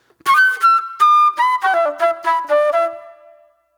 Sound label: Spit Plate Flute